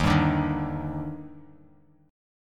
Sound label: D sharp Major 7th, Bass Standard; strummed; JGuitar